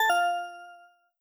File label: correct answer